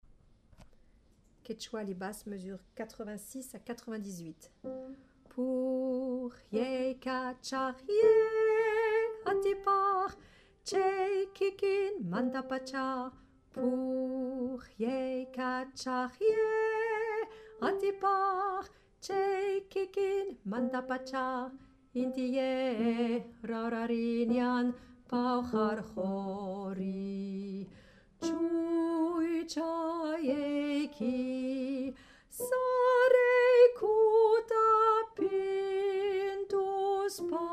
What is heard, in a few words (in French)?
Basse; quechua